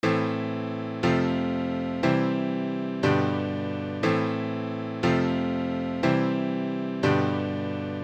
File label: sensitive female chord progression